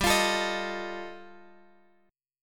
G 7th Suspended 2nd Sharp 5th G chord, Guitar Drop D